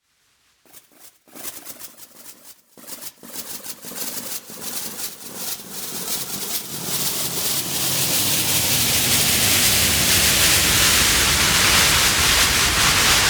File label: In Flight Sparse to Thick